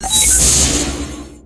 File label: main menu game start; match3